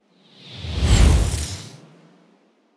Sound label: promo pad; whooshes-rises